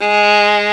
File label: ARCO VIOLIN, Arco Solo Violin